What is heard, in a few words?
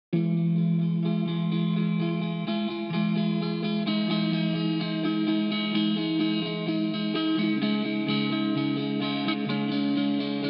Guitar